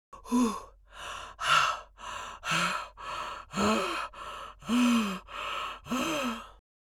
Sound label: Mature woman rapid breathing